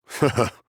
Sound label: hehe; ryoma